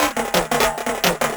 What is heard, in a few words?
Bongo 175, Perc Loops, Drum&Bass; EZRollers